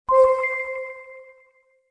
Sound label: respawn